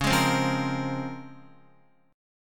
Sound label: Db Major 13th, Guitar; strummed; JGuitar